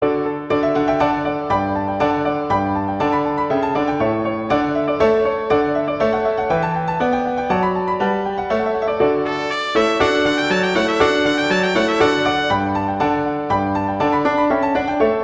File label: Allegro; Telemann